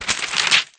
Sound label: harvest exp; sfgame